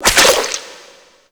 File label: Infested Lich Parasitic Link Impact; Warframe; Abilities; Zeke